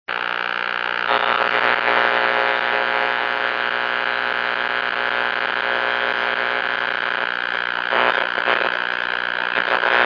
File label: 6m noise NNW